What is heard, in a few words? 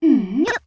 "Mmm Nyuh"; Midna